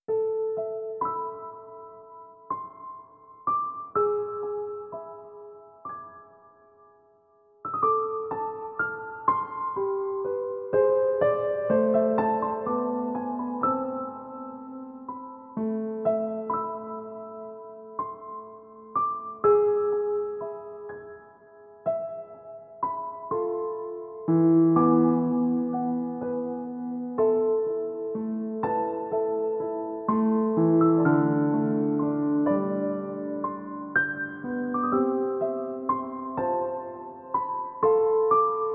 (Piano Version); will a wonderful world android macos ps4 switch windows gamerip 2017

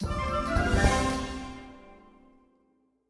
End 108bpm; Music Act Armorica

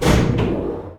Servo Huge Start

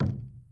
Rope; hero in the ocean 2

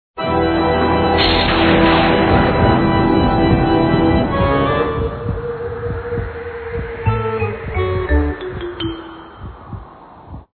Menu Music

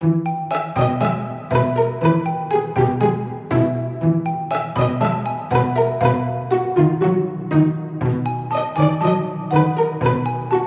Backgroundmusic; Funky Physics; Wii U gamerip 2015

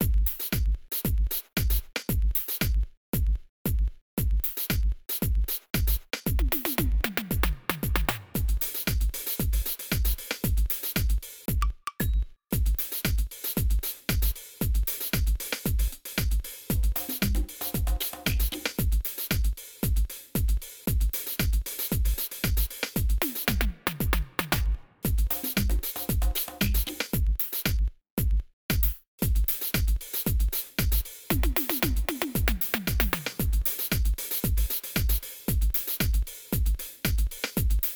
disco drums

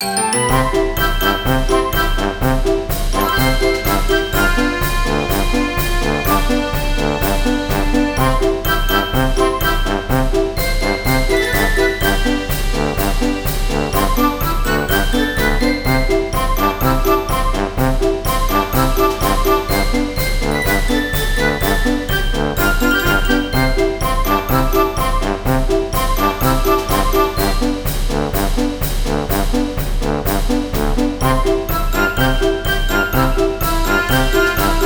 results music loop; Ringling Bros. and Barnum & Bailey; Wii

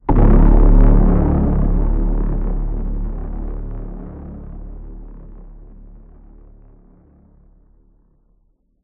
Defeated UI; biomutant

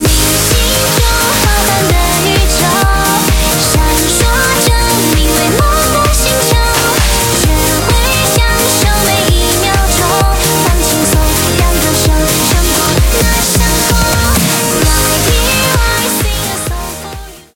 BGM xfade; Invaxion

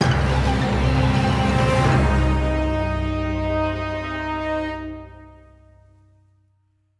Storm Death; Jotun

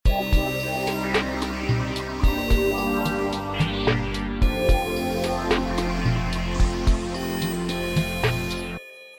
BGM Result Fail; Mortal Blitz

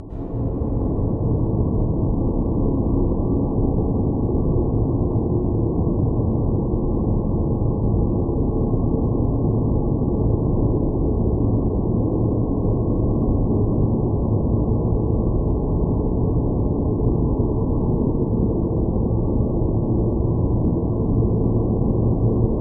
Loading Noise; Dujanah

